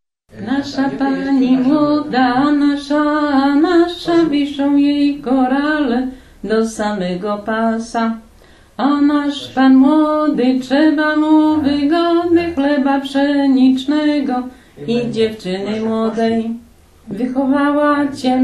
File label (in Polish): weselne; Nasza Pani Młoda; region sanocki